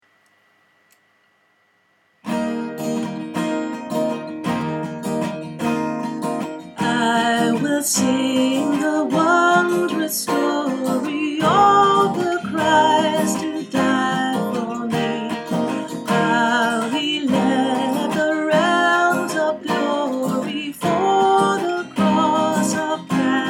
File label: I will sing the wondrous story alto